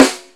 Piccolo Snare Rim; 300 Drum Machines; E-MU MoPhatt

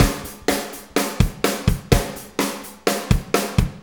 Soul Deep, Drum Tools, Loops; Sonic Foundry (Sony Creative Software); Loops & Samples Drum Tools

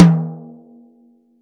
TOM, Drum Dry; East Collexion; Drum 1

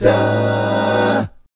guy chords, vocal, jazz; ILIO; Vocal Planet VOL-3; Jazz & FX

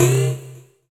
SYN SPIRAL, dance synth; Sound & Vision - Gigapack I CD 2

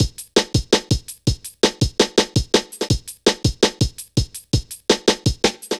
Drum Loops, Jungle; 165.5; Zero-G Jungle Warfare 1, 2 & 3; Skippy B; 90 s Sample CDs

